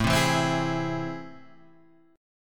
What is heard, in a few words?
A Minor Major 7th; {5 3 2 5 5 4} strummed